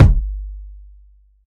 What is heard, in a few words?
fat kick sound g key; kick drum samples